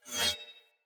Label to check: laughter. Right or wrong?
Wrong